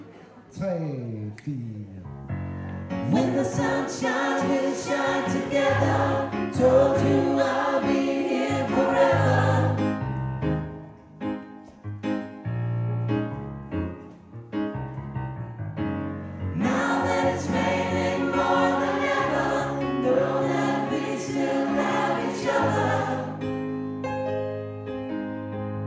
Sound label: alle; kor